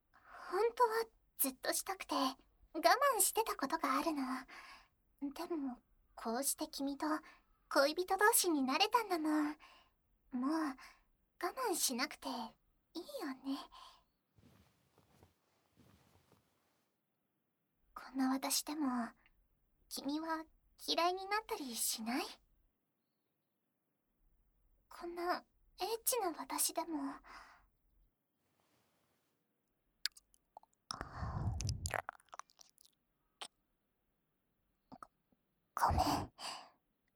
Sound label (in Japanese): 耳舐め, ASMR; もしかして、これから…！？; ズボンの中でさすられて射精